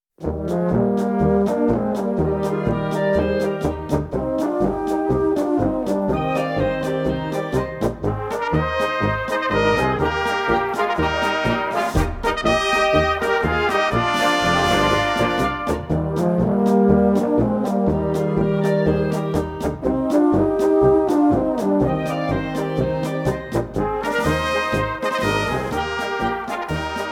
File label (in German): Sorgenbrecher Polka, Blasmusik